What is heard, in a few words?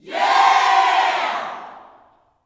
"YEAH", Gospel, YELL SHOUT; Spectrasonics; Vocal Planet Vol.1 Gospel; akai